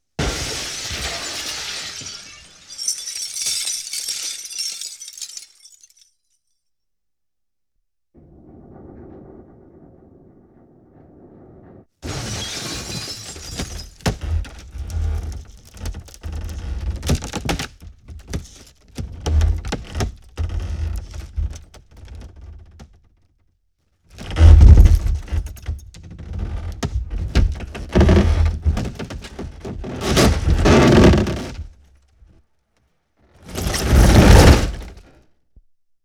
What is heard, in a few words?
Explosion, 5.1 Surround; Sony Pictures Sound Effects Series